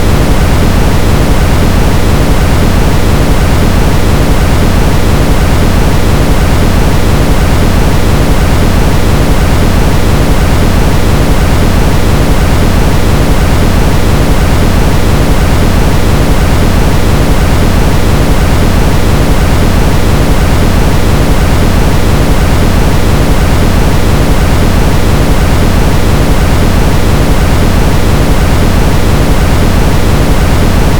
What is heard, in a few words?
1s of brown noise repeated for 30s; Auditory Memory